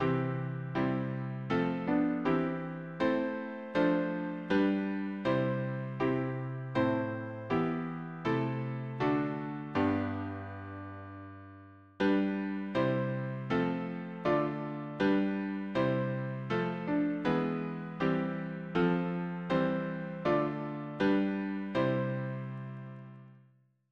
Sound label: harmony, piano